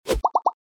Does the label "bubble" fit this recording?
Yes